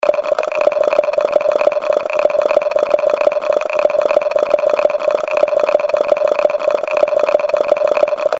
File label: dice; GS390 Prime